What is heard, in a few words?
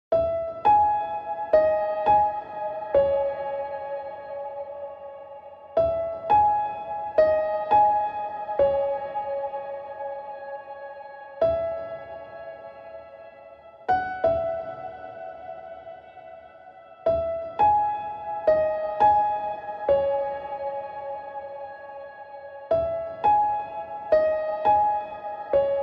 Piano Only